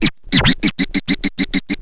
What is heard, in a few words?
scratch short, Vinyl scratches